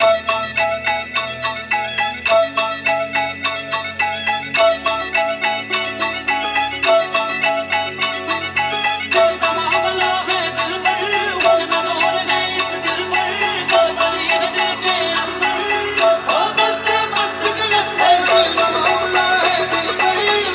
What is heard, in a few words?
Bollywood Dhol Mashup